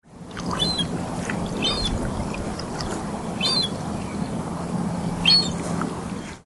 call, birds

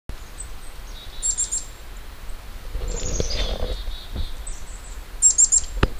Blackcap

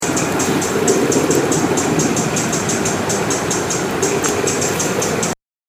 Pacific Wren

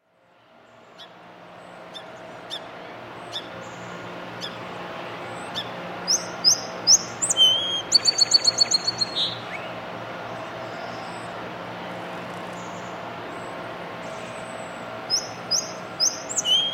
Song Sparrow Song

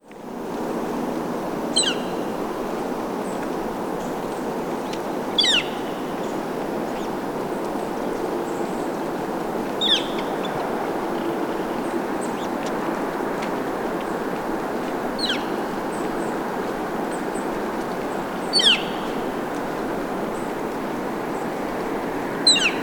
call, birds

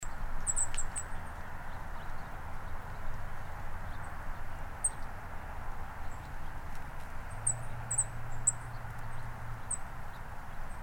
Golden crowned Kinglet Regulus satrapa

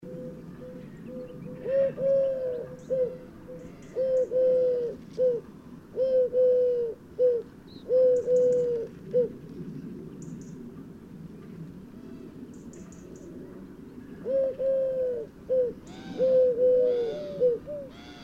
Eurasian Collared Dove Streptopelia decaocto

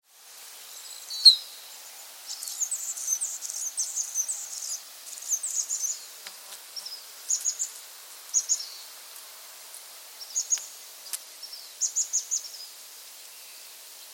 Annas Hummingbird Calypte anna Song

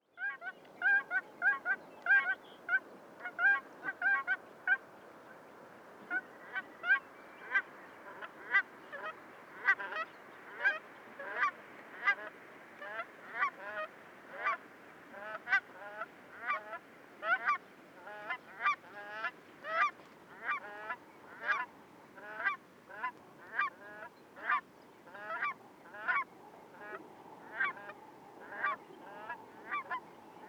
Cackling Goose Branta hutchinsii

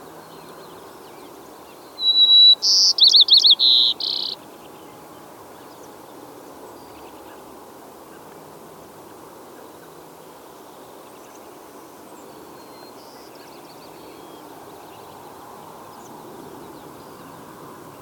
White crowned Sparrow Zonotrichia leucophrys

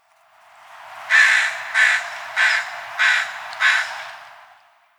AMCR call

